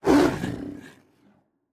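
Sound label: Huntress Run Tiger voice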